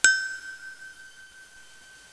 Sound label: Ding